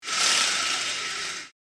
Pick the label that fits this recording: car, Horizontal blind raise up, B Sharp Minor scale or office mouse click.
Horizontal blind raise up